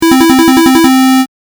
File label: correct; ５連続の大正解の低音ver; 正解の音・ピンポーン